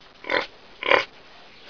Prasa, Zvierata; Revelation Sight and Sound SK

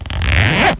Zips; Revelation Sight and Sound SK

